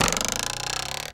RULER, rhythmic hits; Rarefaction; Poke in The Ear With A Sharp Stick

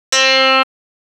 HARP; CMP - Analogon; THE PUZZLE

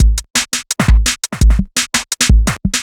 Beat, Drum N Bass

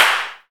CLAP, HAND CLAP

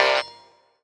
TING; Rawcutz - Nu Forms; Gbm

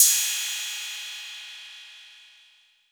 Roland 808 CY

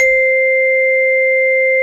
Glock C5, Fairlight CMI IIe, Mallets

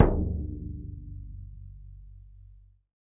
BODHRAN, Celtic Percussion

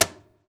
CAJON; Univers Sons - Raricussions

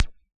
Pulse L; Yamaha DJX; DJX Kit

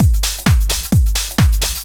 drum 130 trance

